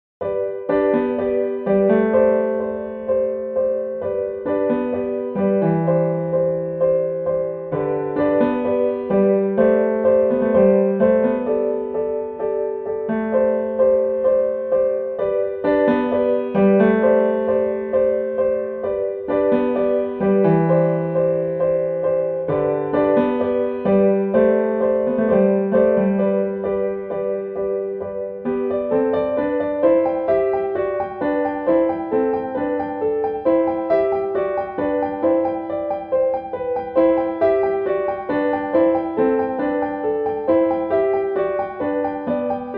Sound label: Cello